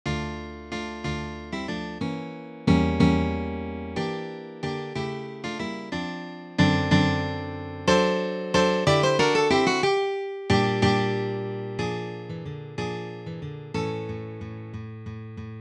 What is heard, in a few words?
Grand Solo; Sor